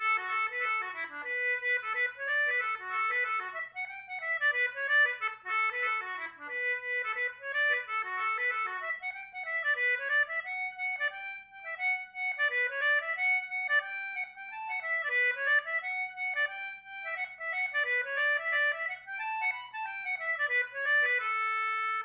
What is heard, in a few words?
Celtic Button Box; Wind That Shakes the Barley; reel